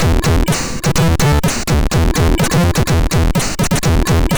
Test, sid MOS6581R4

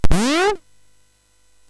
SFX, MOS6581; Narco Police; hvsc

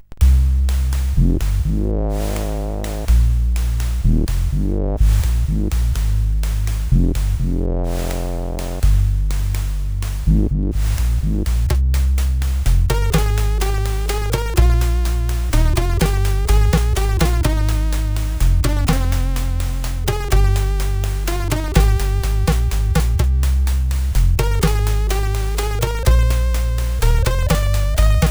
Oeglejazz, sid CSG8580R5

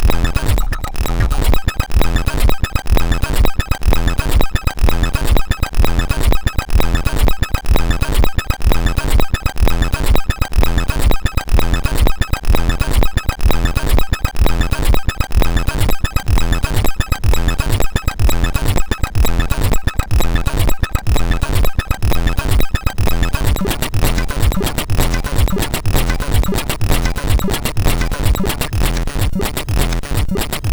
Techsound sid MOS6581R2

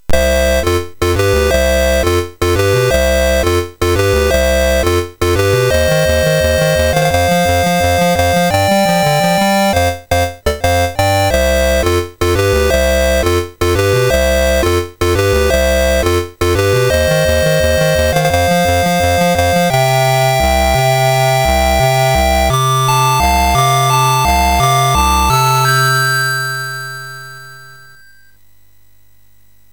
Slap Fight, MOS6581R3; hvsc

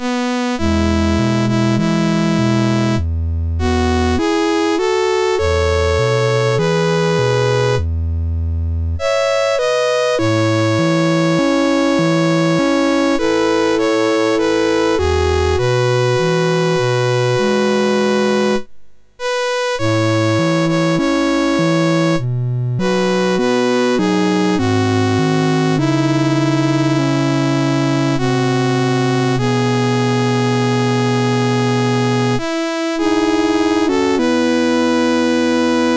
Shenadoah, MOS6581R3

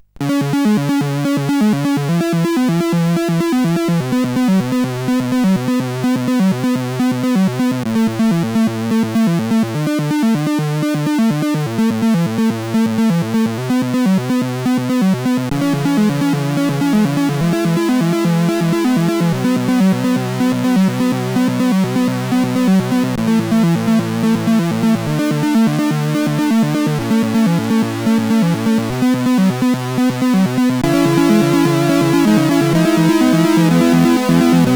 Relaxing Tune, CSG8580R5

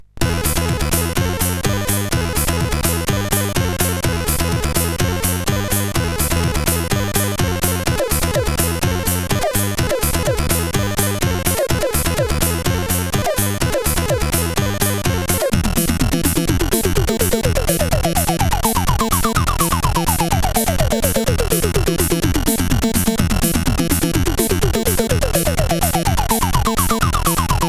Crazy Techno, sid CSG8580R5

